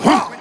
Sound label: huh male, Voice